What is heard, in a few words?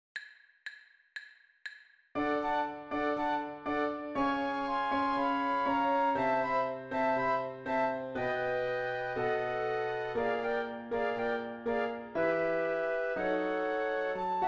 joplin ragtime 120bpm